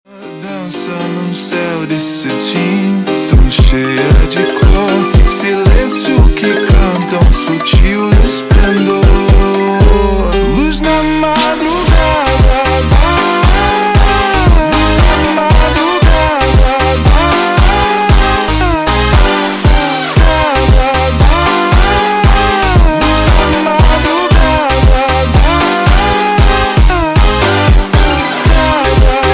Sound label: slowed reverb